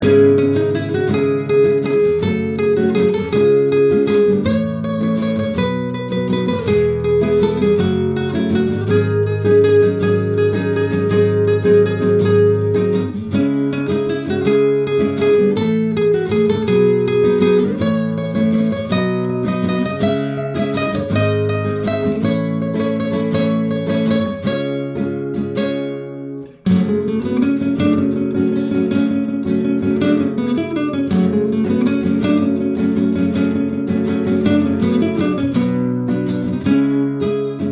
Bard Instrumental Loop

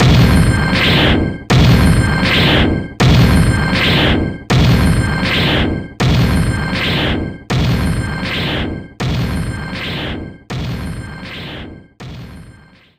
Menu pzl logo

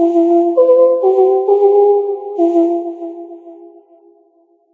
Jungle Ambience Higher; And Yet It Moves

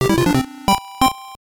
Jingle; Doraemon Taiketsu Himitsu Dougu; Game Boy

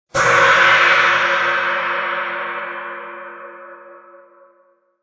Gong; sushi cat

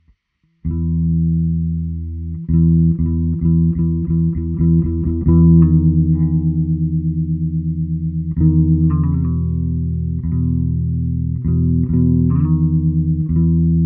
Very chill guitar; Hylics

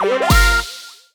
Jingle Go; clubhouse games 51 worldwide classics 2020 switch gamerip

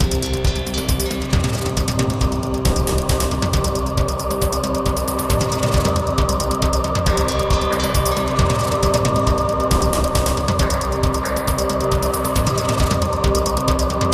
MUSIC PRIEST BOSS; bloodrayne

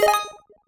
Dice

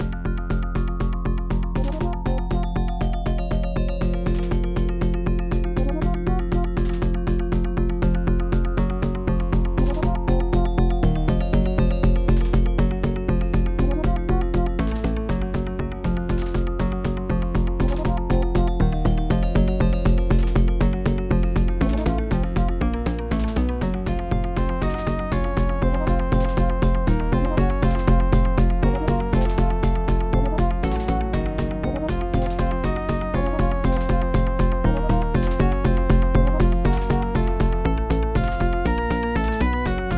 SoundBlaster16 AdLib; Xandralite Mines; Blackthorne; Blackhawk; DOS

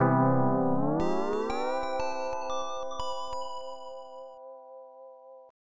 Melody Logo; Night Slave; PC-98

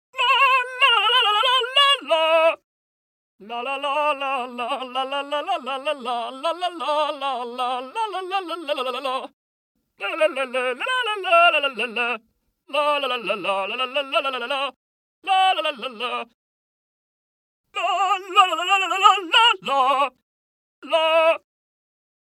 Singing Sword Sing Loop

